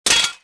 bounce, saber; weapons

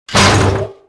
walk; Star Wars Jedi Knight II Jedi Outcast; XBOX; mark1

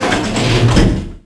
door sliding electronic; doors elevators; Star Wars Obi-Wan; XBOX

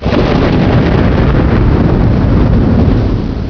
thunder; Chase Hollywood Stunt Driver; XBOX

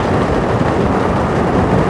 rollercoaster loop; Tony Hawk's Project 8; XBOX

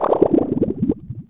bubbles; Finding Nemo; XBOX

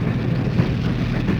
rail, Impact; Cars; XBOX

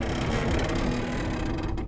metal creak medium

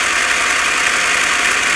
rc car engine loop; Tony Hawk's Project 8; XBOX; houses

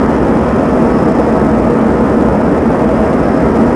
jet; WhiteOut; XBOX

